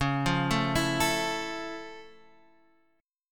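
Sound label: F Augmented Major 7th D flat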